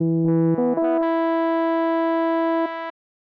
The Horn

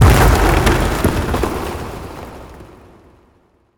environment rocks falling fall

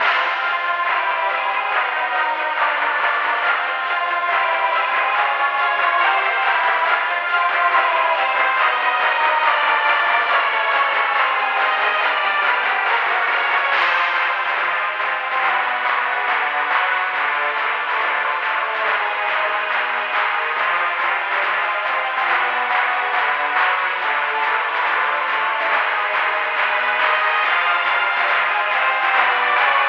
find opponent search music loop; hearthstone heroes of warcraft w expansions; android ios macos windows gamerip

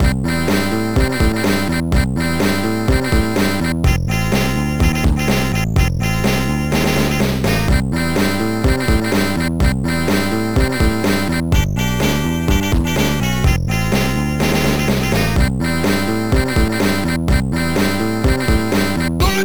Rock'n Roll; Jumping Jack Son; amiga

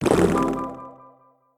Potion drink

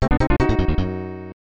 Tada; Heisei Shin Onigashima; snes

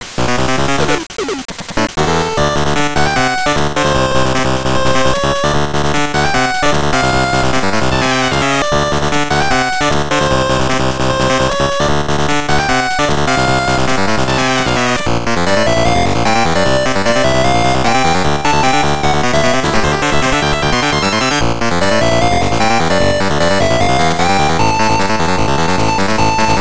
Funky Guy (Course BGM 6); World Circuit Series GB

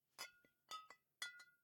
Bottle Shake; The Town

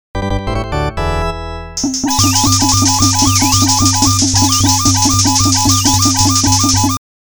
Goal

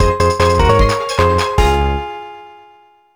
Funny Jingle; Inspector Gunner 2 with Critic

